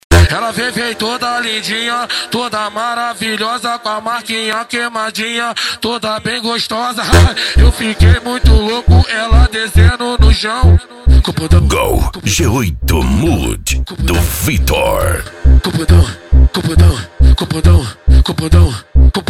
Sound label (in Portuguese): gol g8 mud especial funk ritmado